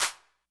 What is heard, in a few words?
clap